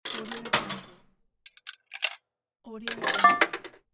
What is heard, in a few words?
slot machine coin; audio tree